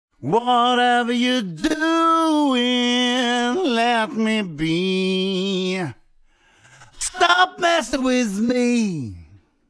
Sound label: Singing Voice